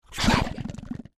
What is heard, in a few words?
Creature Grunt Lip Flaps Comical; Monsters; Horror; Big Room Sound